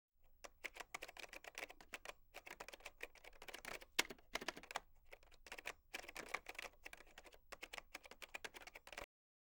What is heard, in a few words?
Typing On Computer Keyboard; Fairlight Sound Lib